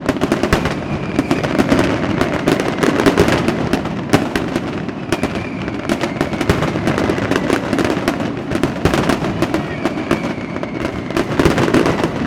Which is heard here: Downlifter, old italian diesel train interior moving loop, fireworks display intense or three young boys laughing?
fireworks display intense